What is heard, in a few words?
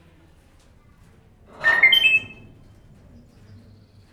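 two at once, grey parrot; pecsi zoo 2017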